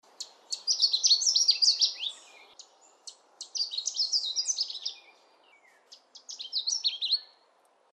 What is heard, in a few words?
warbler canada; species/birds; wbba